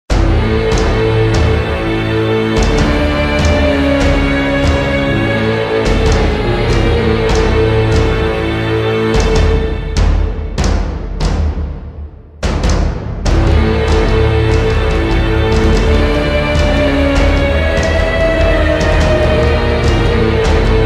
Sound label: metal cover; sukuna vs mahoraga; jujutsu kaisen